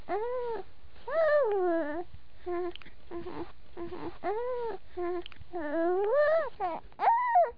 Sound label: baby; Vitra